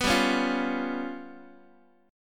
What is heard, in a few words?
G 7th Flat 5th, G, Guitar; G7b5 strummed